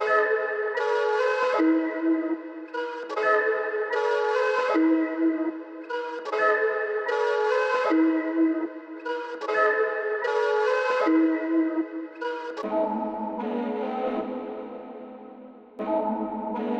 76 BPM G# Min Flute Chops; Trap; Loop Stems; Cymatics; 2022 Melody Collection; Trap Pt 1; Balenciaga

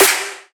Future Bass Snare - G; Drums; One Shots; Snares; Cymatics; EDM Starter Pack

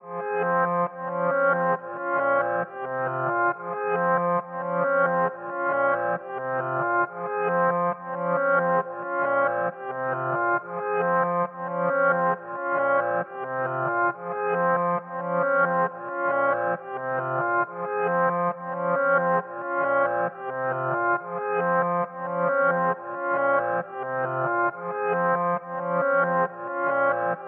136 BPM F Min Reverse Piano; Melody; Loop Stems; Cymatics; 2022 Melody Collection; Cameras

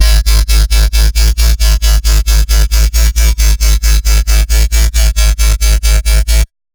Bassline C3 140, Dubstep; GhostHack

